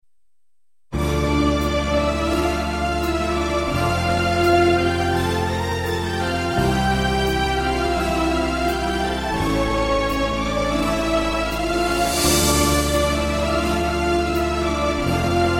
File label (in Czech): Václavská hymna dlouhá pomalá